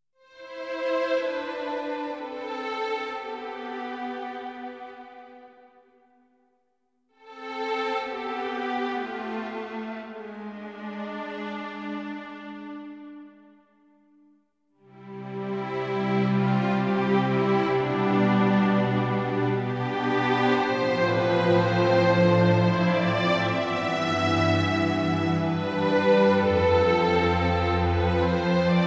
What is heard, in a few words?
Strings